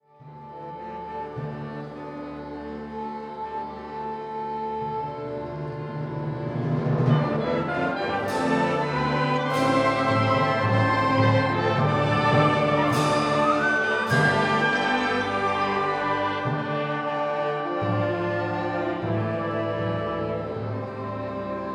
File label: hydraulophones in concert; hydraulophones in concert audio excerpt of ending